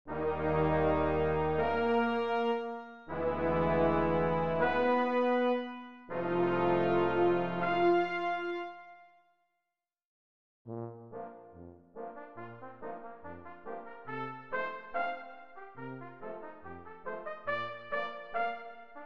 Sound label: PIZZICATI brass